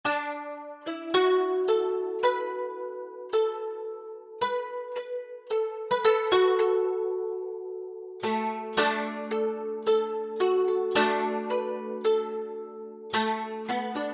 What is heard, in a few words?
koto traditional japanese music; prettysleepy; instrumentos tradicionales